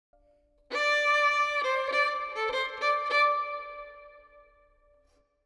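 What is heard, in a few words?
Verb, Violin, 4-4, D minor, 100 bpm; Melody; Sample Banks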